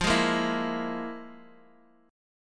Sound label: F Minor Major 7th Double Flat 5th, F, Guitar, Open D; x,x,3,2,1,2